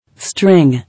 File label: String